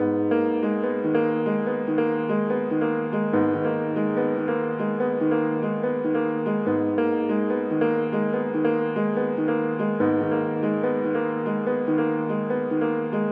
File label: Drill Melodies G- 144bpm piano; Drippy